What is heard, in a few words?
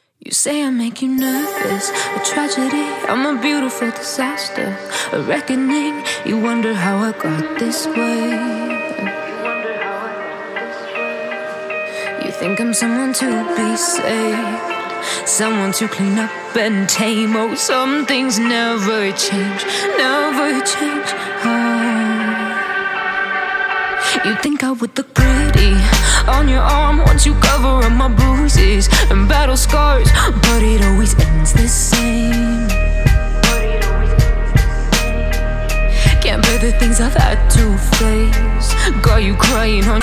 trap music; ncs copyright free